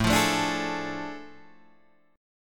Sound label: A Major 7th Suspended 4th Sharp 5th, Guitar, Open G